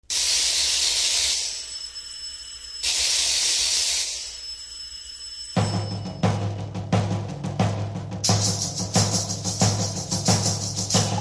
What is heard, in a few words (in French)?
La farandole Percussions; Pierre Gabaye